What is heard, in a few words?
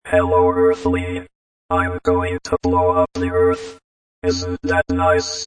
Alien